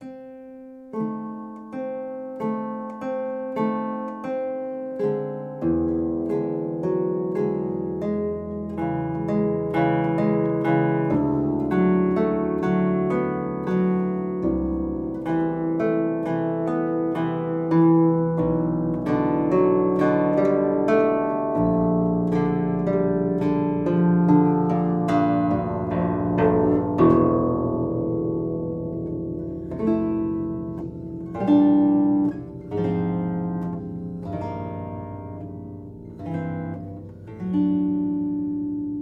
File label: Improvisation, Zither